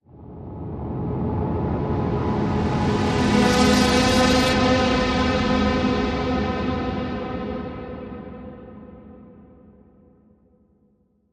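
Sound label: Whoosh; Stretch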